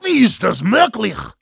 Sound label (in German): Heavy jeers, de; Wie ist das möglich?; Sprachbefehle